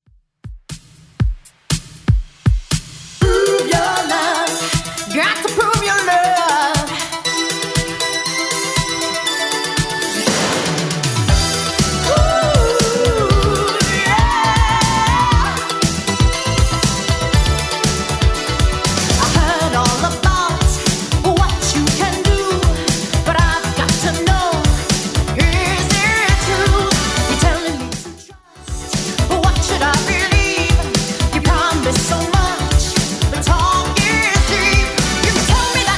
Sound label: 80s Dance Redrum 119bpm